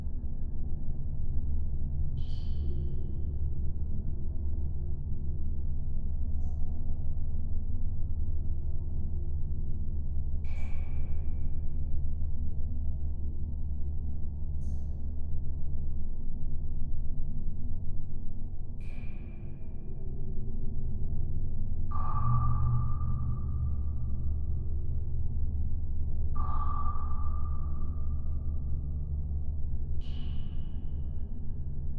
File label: 3 drops water, mono; cstrike; communityblock 2020